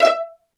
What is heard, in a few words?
PIZZ CELLO E4 R; Greytsounds VOL-1; STEREO PIZZ2